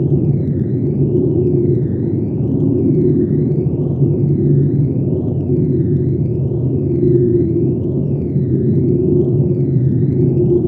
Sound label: ambient starship hum; Stellaris